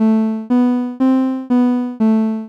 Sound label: music